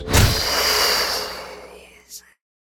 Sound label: Dark Spell Life Tap; Sound Spark LLC; Magic Spells, Buffs and Attacks